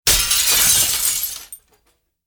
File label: glass shards smashing